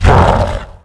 GOBLIN attack; Eft

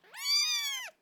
Summon Cat Attack; Eft

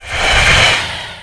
BackGround Goblin; Eft

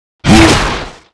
GREATWALLSOLDIER ATTACK; Eft

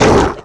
Ice Melee HIT

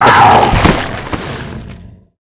Die; Blackspider; Eft

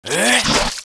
Gold Beetle attack

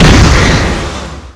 Weapon Gun Hit

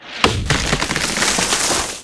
TREEMON Hit; Eft

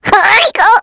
Raccoon Die, game; Eft

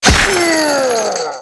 Cow Die

